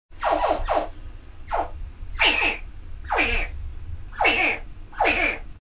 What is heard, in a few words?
baby croc chirp